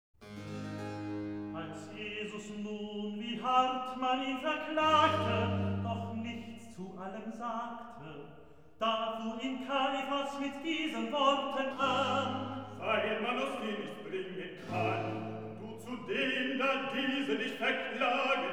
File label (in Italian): Recitativo, Coro; Als Jesus nun; Er hat den Tod verdient; Brockes Passion